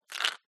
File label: batteries aa tip dense rattle; skyclad sound